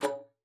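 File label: C#3, bass flute; Xsample VOL-2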